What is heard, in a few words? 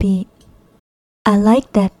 Vocal; Sonic Foundry